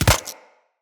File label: Arachnoid Micro Land; Enemies; Corpus